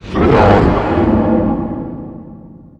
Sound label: spit; satan